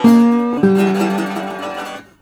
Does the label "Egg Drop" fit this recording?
No